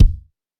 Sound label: kick single shot